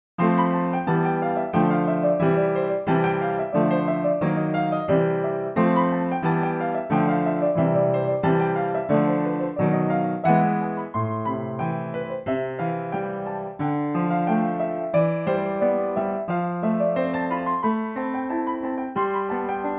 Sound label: Piano